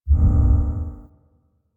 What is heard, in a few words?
UI Zoom In; DE; Warframe